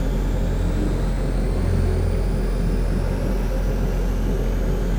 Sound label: colossus windup; aquatics